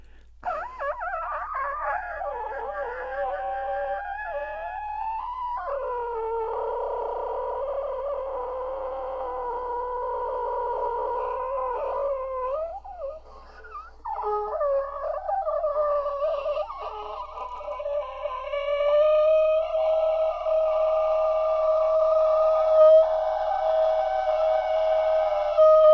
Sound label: bird